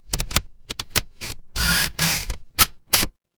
mechanical machine

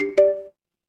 feedback confirm

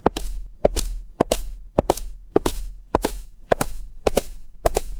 footsteps concrete

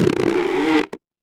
cartoon stretch

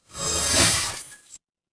magic scifi portal

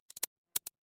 office mouse click

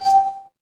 magic scifi hologram

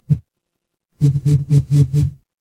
office phone vibrate

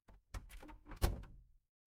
door close

